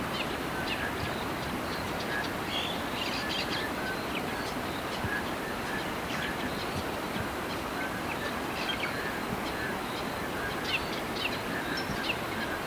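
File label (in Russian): разноголосое нежное приятное пение птиц на фоне спокойного водопада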